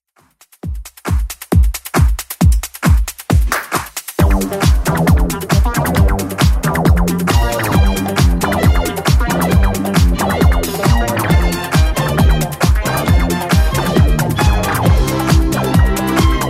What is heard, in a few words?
70s Club 135 bpm; remixplanet